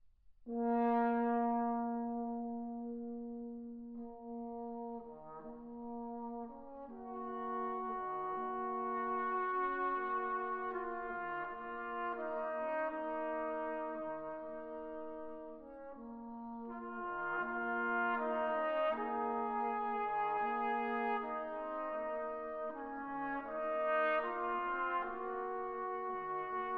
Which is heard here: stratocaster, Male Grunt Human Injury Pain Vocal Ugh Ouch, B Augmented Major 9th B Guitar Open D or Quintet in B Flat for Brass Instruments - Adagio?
Quintet in B Flat for Brass Instruments - Adagio